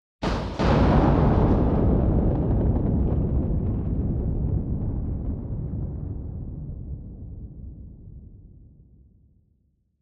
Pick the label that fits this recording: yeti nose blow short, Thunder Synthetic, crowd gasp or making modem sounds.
Thunder Synthetic